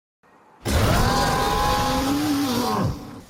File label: roar; odogaron